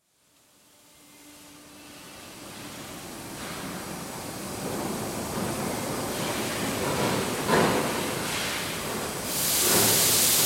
Stainless Steel Making, hot stainless plate mill; Hand Tool Sound Effects; BBC

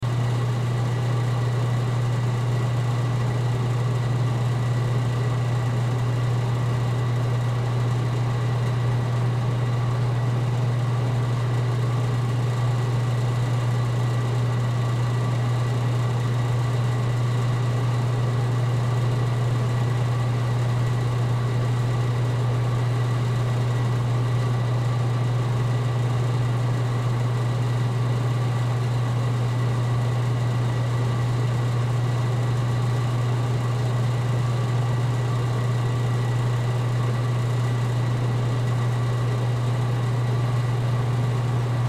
Bar Near Beer Cooler Business; Bars And Club; Ambience; Blastwave

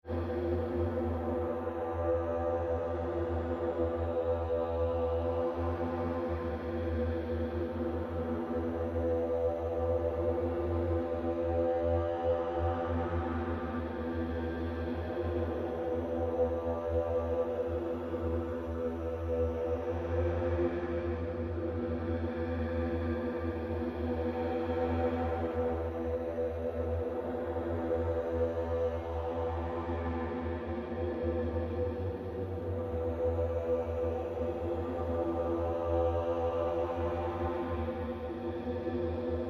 Created Drone, Drone Sound Effects; sound building blocks created through manipulation of raw material

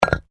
PAVING STONE FALL; Material components for constructing more complex sounds; Drop Sound Effects; Drops (Sound Effects:Objects)

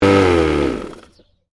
Lawnmower (Electric): Switched Off; Lawn Mowers; Machines; Stuart Duffield

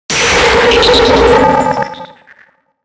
Accent Digital Warble; Production Element Imaging Element Accent Transition; 5.1 STEREO MIX; Blastwave

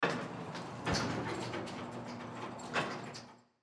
Elevator Doors, Elevator Doors Close; Elevators; Machines; Industry; Blastwave

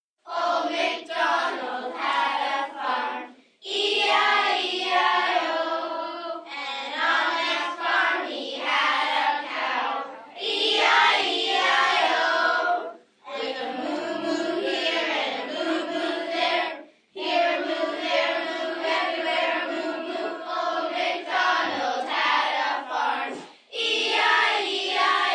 Singing, large group, 20+ children; human voice; kids, males, females, girls, boys, song

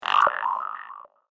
Mysterious Alien Sounds; star wars / trek style games

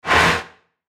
WHOOSH METALLIC, Whoosh Metallic Reverb; Blastwave

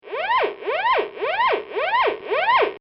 Boat Coast Guard Siren; Bobby Cole

